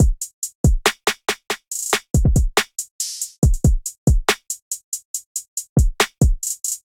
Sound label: MPE Kit, Trap Drums; MPE Flux Kit